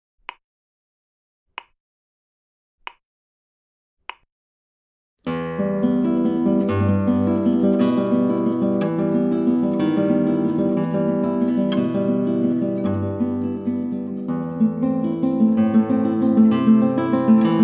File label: Etude in E Minor, classical guitar; classical guitar mixed books